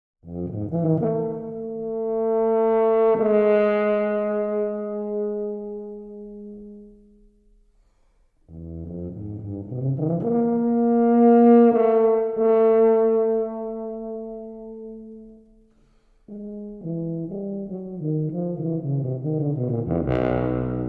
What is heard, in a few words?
Profiles for Tuba Quartet, Abrasive